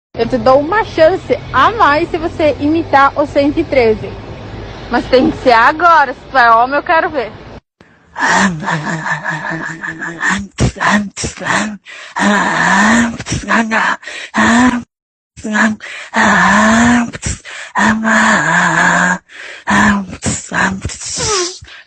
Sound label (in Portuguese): imitando scania 113